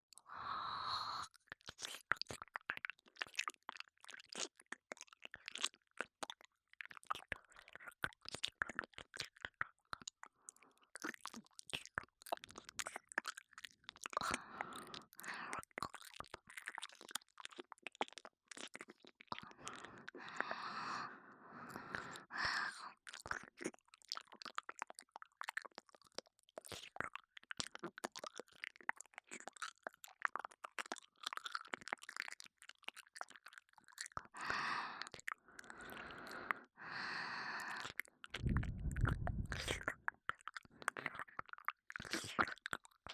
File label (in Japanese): 両耳舐め